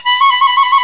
FLT FIFE, British Fife, Fife Trills; Roland LCDP04 Orchestral Winds; 90 s Sample CDs

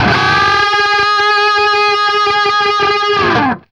DBLE G#3 CUT, guitar; East West; Steve Stevens Guitar Samples Collection

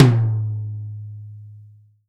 tom, Real Drum Kit, funk kit

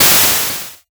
NOISY HITS, Snares; LIMAT LSDJ kit

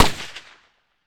RIFLE; Roland - LA Composer vol. 1

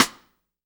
sidesticks; Spectrasonics - Backbeat

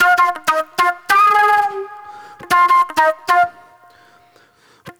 NY 70's Jazz Flute

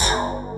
SYN MOUTH, BASSES; Pro-Rec - Synthsonic

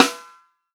FUNK SNARE CHROMATIC, REAL DRUMS; Best Service ProSamples vol.43 - Real Drum Kits

